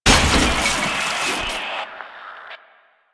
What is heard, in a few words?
Destroy Television; Warframe; Ambience; Nineteen Ninety Nine